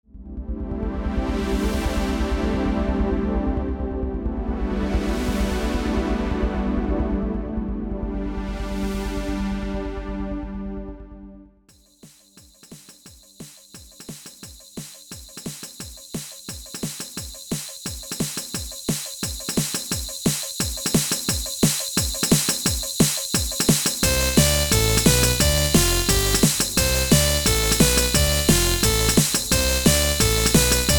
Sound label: drum sky